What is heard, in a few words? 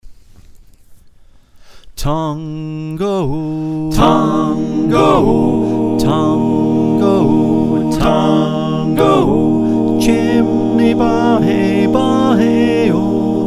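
soprano alto tenor bass, choir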